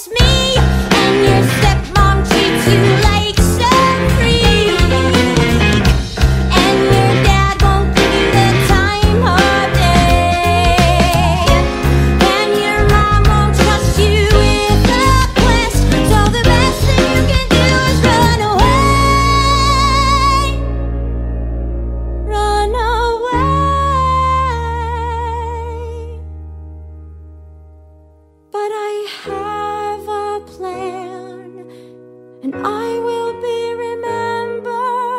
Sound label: vocals